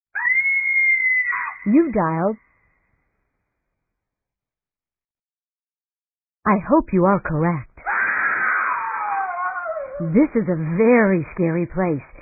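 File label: House of Horrors, Answering Machine Messages; House of Horros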